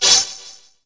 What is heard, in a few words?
Leak Plugged; Finding Nemo; XBOX